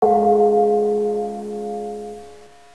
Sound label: dong